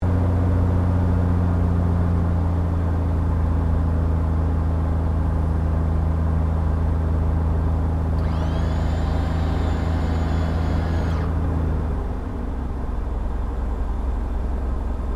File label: AIRCRAFT CESSNA O-1 BIRDDOG APPROACH INTERIOR, spotter aircraft; Airplanes (Sound Effects:Vehicles)